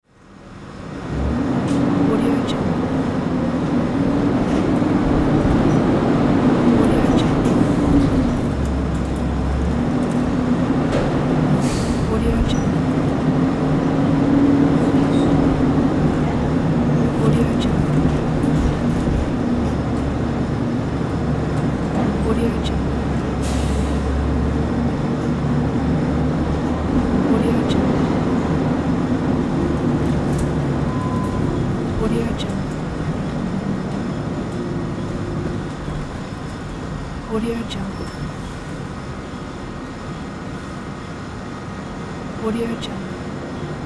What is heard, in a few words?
city bus interior